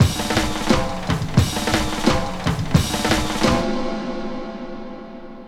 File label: Rugged Break, Drum & Bass, Beats & Breaks; Fluid Dynamics